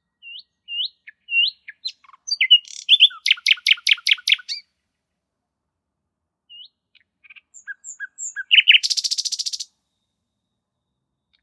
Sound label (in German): Vogelstimmenspiel Nachtigall